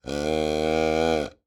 Sports Buck Caller Call

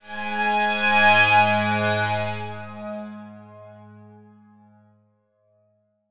Transition Musical Tone Medium; Production Element

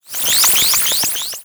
Transition Digital Scanner; Adobe; Production Element

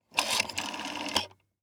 Household Phone Dial Rotary Five

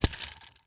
Foley Toy Gun Spin Revolver

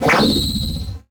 Flash Wipe Electronic; Multimedia Internet CD-Rom Flash

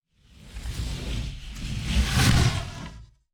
Sliding Whoosh By; Coll Anderson; GDC Game Audio Bundle

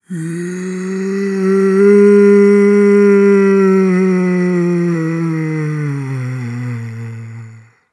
Horror Scary Ghost Moan Yawn Ghost Moan

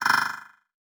Multimedia Internet CD-Rom Flash Click Multiple

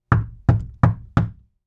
Door Knock; AdobeCC; Household

